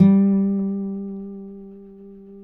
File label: Finger G 3 L, Guitars; Spectrasonics; Hans Zimmer Guitars VOL-1